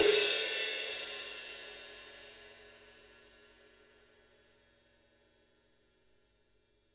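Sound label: ride, drum kit; Jazzistic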